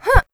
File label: jump; player